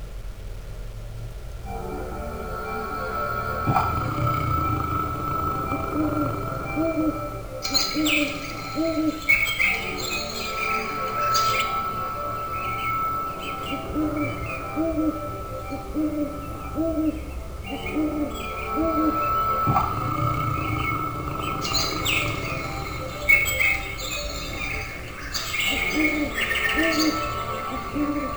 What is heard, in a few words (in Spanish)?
BOSQUE